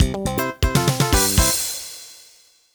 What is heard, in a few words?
Building Complete; City Bloxx